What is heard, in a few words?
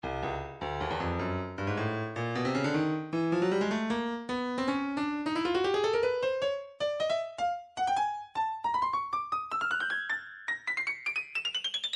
breakbeat 155; Stylus; spasm